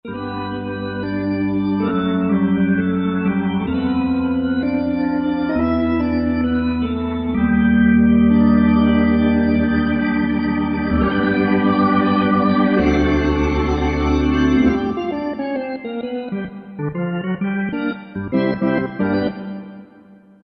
British B3; '60s & '70s; Roland SR-JV80-08

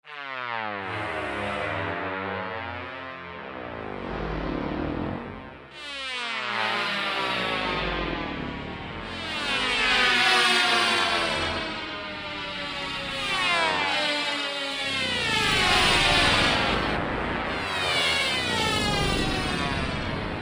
M1 Racer, Korg MSC-1S; Korg MSC-1S Variety

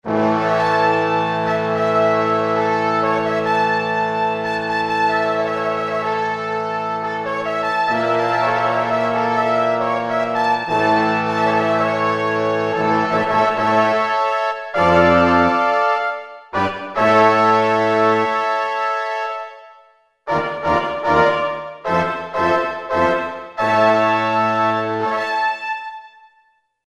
Soaring Brass; Kurzweil K2000 Orchestral ROM

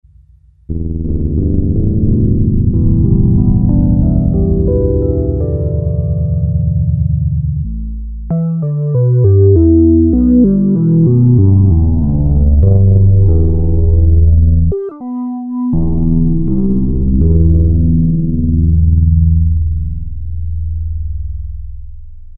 Unison Solo Low, Korg PolySix; Factory Presets Bank A

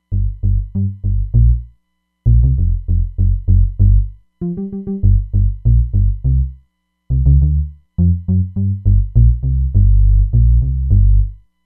HipHop Bs, Roland Fantom XR

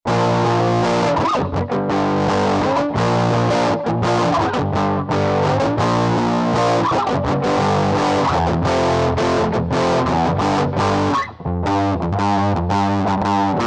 BOOGIE, Digitech RP200 Factory Presets

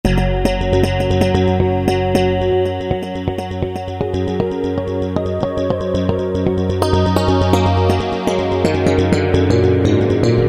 Detune Bass, Roland U-110; Factory Patch

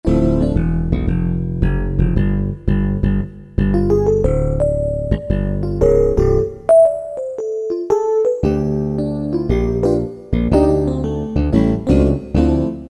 E-Bass and E-Piano, Roland D-50; Factory preset demos

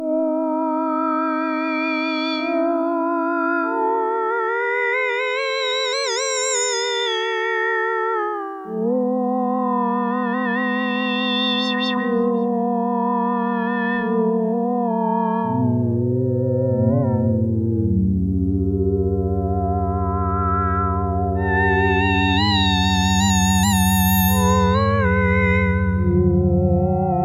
HORROR, Korg DW-8000; Factory Patches

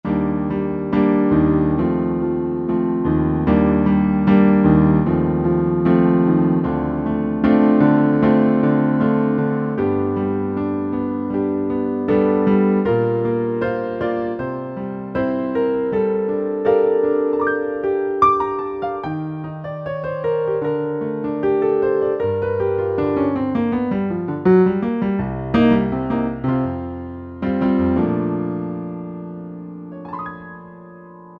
Warm Grand, Yamaha PSR-3000 Factory Voices Piano

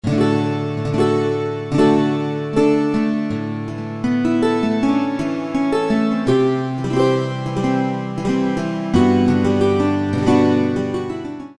Foksy, Yamaha TG33; Factory Presets

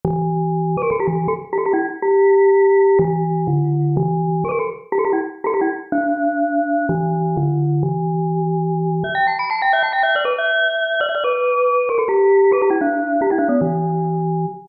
Inharm, Generalmusic Equinox

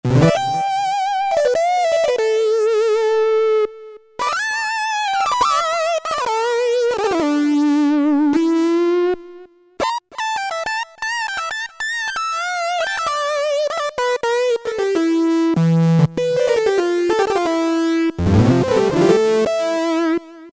Edge Lead, Korg MS2000; Factory Patches

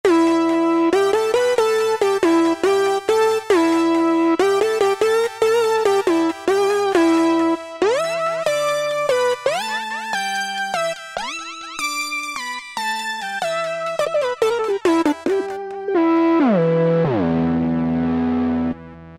Saturator, House Collection; Roland SR-JV80-19 House Collection

